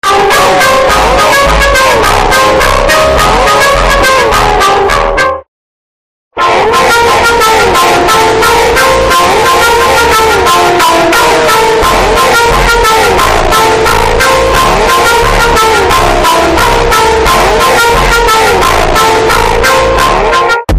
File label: phonk